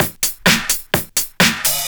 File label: Straight Loop 128 BPM, House